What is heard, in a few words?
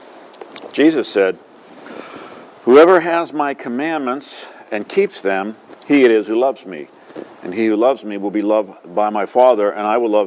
Gospel